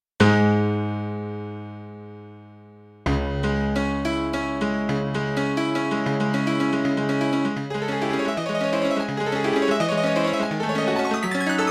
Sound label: Piano